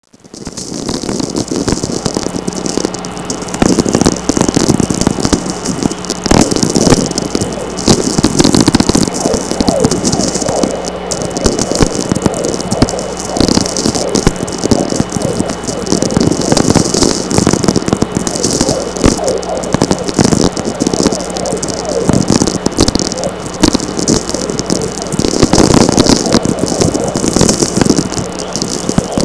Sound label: chorus; Natural VLF Radio Emissions